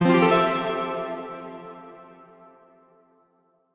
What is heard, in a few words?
Clear C0 Echo; Mixolumia; Tessellation